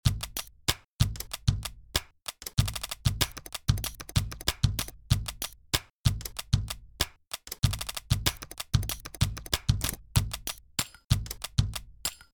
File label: klik klak